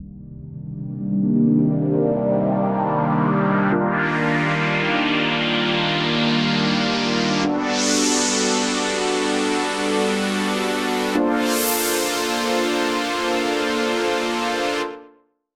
pads; 129BPM; Pony Fan Music Remix Stems

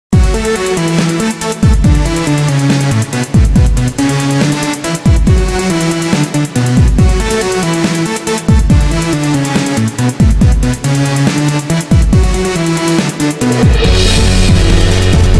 Bagpipe Dubstep Remix

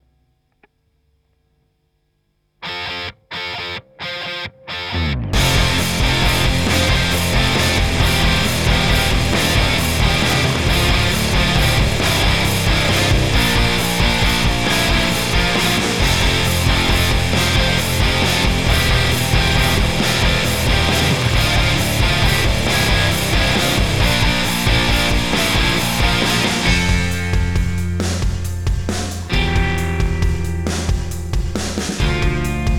When Home Calls (Instrumental Cover)

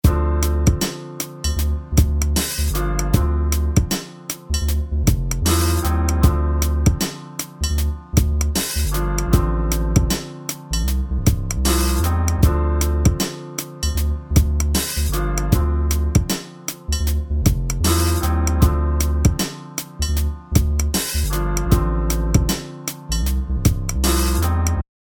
some jazz

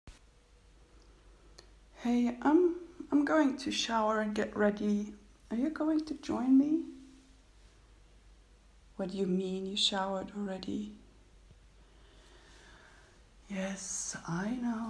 Shower, Moans, Water, Bottle Sounds; ASMR; GFE; no SFX; F4A; Erotic audio

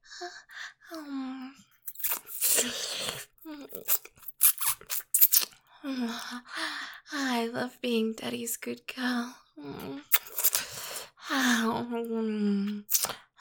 Sucking noises; Daddy

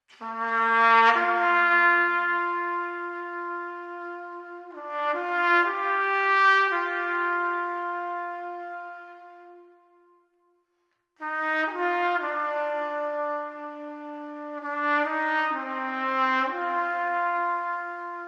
Fanfar

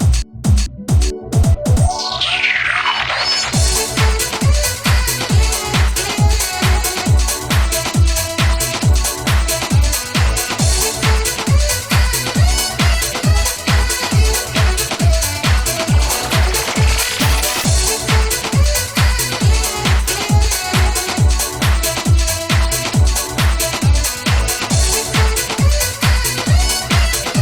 Victory Fanfare; Thanks From The Mayor; The Showoffs Present Criminal Sound - The Music of Canterlot Siege 4

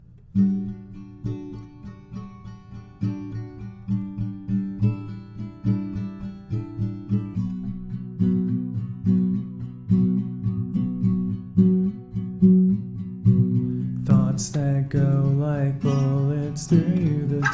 Singing, Playing Acoustic Guitar; Cover

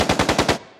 Monster; Zenless Zone Zero

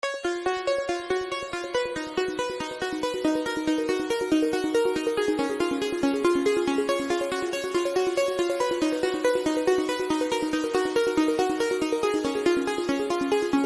Random Trance

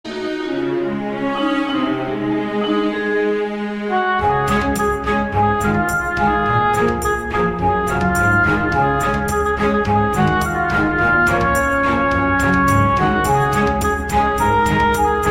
Orchestral Remix

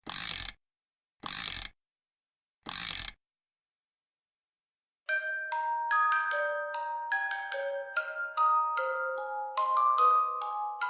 Lullaby; Remix War V

